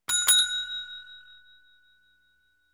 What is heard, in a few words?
Dinding